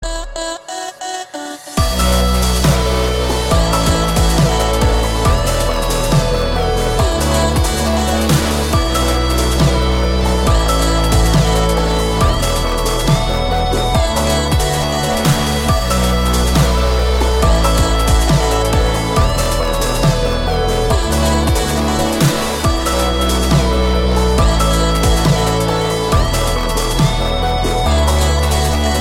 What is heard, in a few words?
Liquid Chillstep